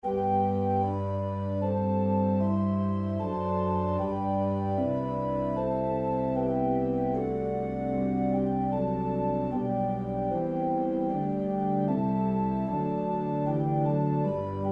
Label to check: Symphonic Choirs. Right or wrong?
Right